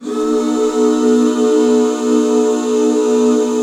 B 7; Best Service Gigapack 1

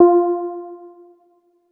lead keyed F; leads

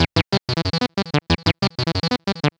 Heavy Industry Syn, Keyboards

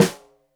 Snare Stereo, Drums; Deeply Sampled Drums; SMDrum

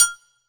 SR L AGOGO, Drum Mashines, SR-16 DRUMS; EdgeSounds

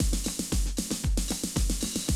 Classic Drum Loops

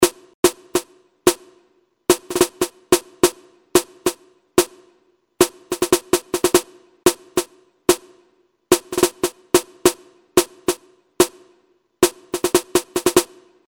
Tin Snare; Industrial

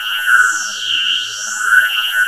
3100 G 3, Korg; Back In Time Records; Korg Universe VOL-1

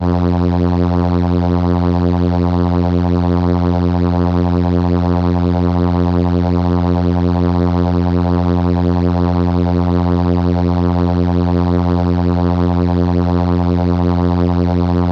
Trumpet F3, ARP Solina

